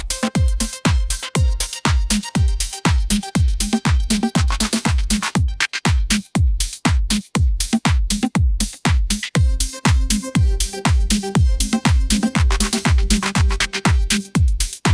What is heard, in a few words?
upbeat pop melody; ai